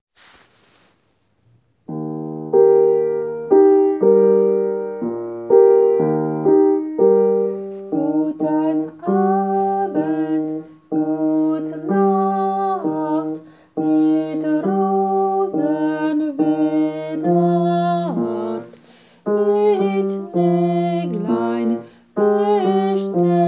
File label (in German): Wiegenlied bass